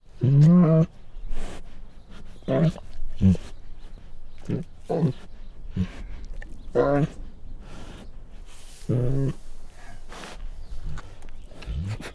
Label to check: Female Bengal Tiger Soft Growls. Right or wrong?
Right